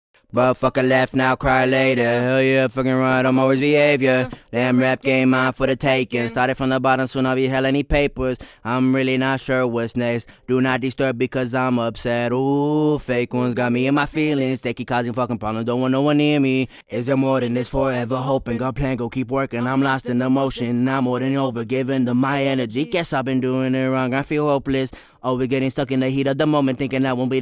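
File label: 111 C minor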